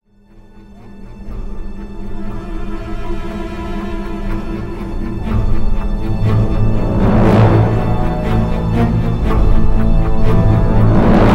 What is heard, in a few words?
battle intro; lego universe; Gnarled Forest; darkling